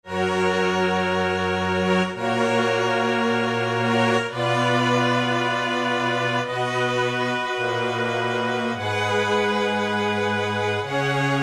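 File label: ensemble quartet; Factory Presets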